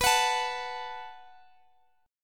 Gb Minor Double Flat 5th B, guitar

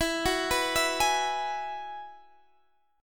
F sharp 7th Suspended 2nd Suspended 4th, E

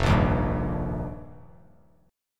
F 9th Suspended 4th, Bass Standard; strummed; JGuitar

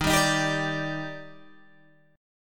Eb Major 7th, Guitar, Open G

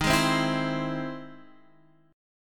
E Augmented Major 7th

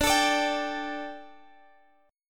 D Suspended 4th D, guitar

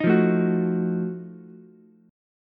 F 7th Flat 9th F down Ukulele C6; F7b9 strummed

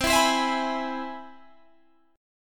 C Augmented Major 7th, Guitar, Standard; strummed; JGuitar

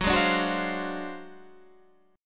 D 7th Sharp 9th Flat 5th F sharp, chord

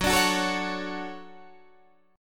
D-Major 7th Gb

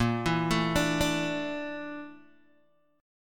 Bb Major 7th Flat 5th, Guitar, Open G

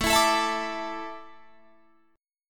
A Suspended 4th, Guitar Drop D

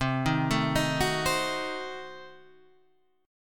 C Diminished 7th C down Guitar Standard; arpeggiated; JGuitar

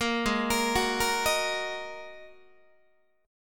G sharp 7th Suspended 2nd G sharp Guitar; G#7sus2 arpeggiated; 13,16,13,16,14,14; JGuitar

